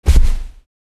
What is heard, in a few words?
Human body fall on dirt grass